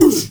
MALE WAR, SHOUT; Heart of Africa; Spectrasonics; Heart of Africa 1; CH. SHOUT2

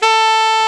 SAX A. MF A, Alto Short; Roland LCDP07 Super Sax

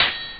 hihat; Amiga Soundtracker Sample Packs

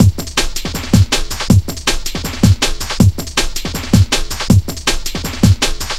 160, Drum Loops; Total Drum&Bass

